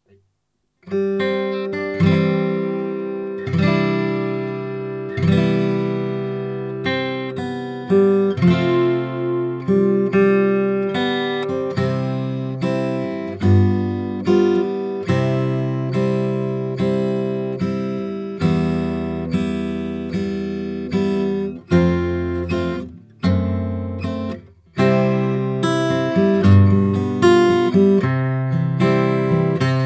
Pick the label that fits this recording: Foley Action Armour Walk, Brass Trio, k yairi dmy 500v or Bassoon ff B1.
k yairi dmy 500v